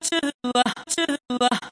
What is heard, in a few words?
grunty vox, 140 bpm; stutter vox; REMIXABLE COLLECTIONS